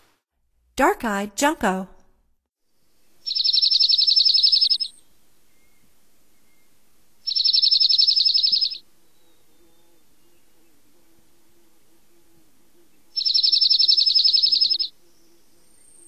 Dark-eyed Junco, Songs of Birds of North America; Stokes

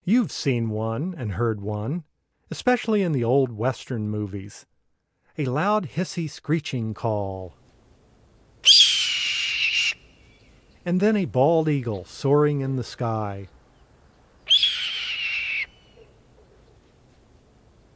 Red-tailed Hawk, Bird Sounds; North America; Common Western Species; Know Your Bird Sounds

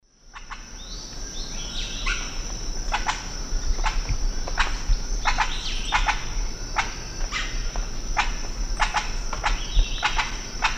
Psarocolius viridis, calls; S. America; Eastern Lowlands

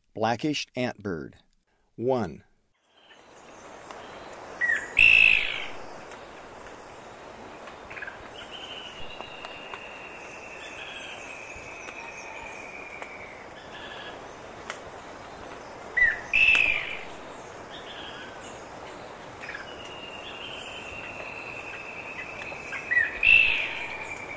Blackish Antbird, Eastern Lowlands, S. America

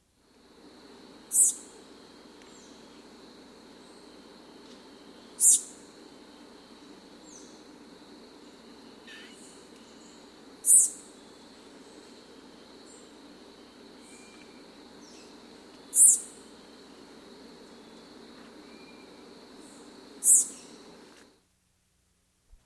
Silver-Throated Tanager; S. America

